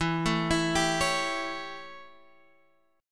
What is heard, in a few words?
A 7th E, chord